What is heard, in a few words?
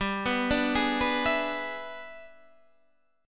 E 7th G, guitar; E7/G arpeggiated; JGuitar